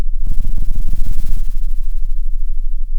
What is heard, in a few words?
pop, Sound of a cat purring